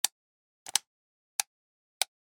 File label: Light Switch On Off; noise creations